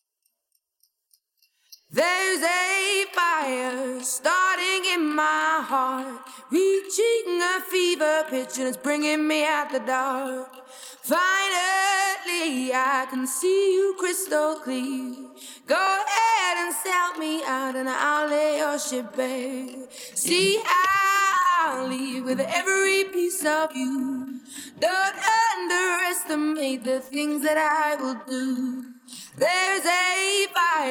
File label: Vocal